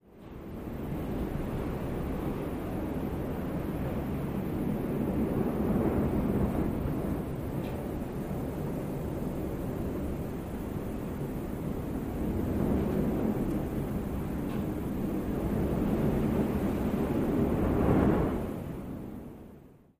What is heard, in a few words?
wind, nature